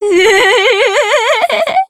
cackle

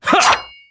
slash; jin

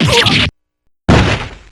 damage; p4u; adachi

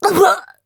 cough

